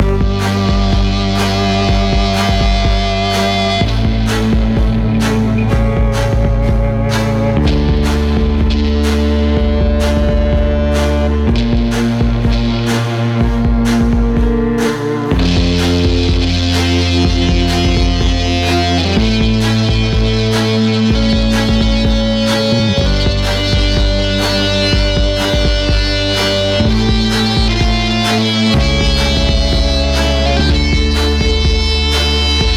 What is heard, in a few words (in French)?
musique guitare